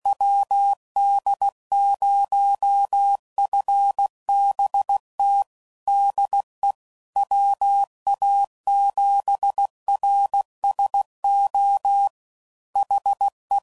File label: QSOs, CW Code Groups 5WPM; HamRadio; Morse Code; WD0FBT